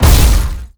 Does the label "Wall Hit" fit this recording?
Yes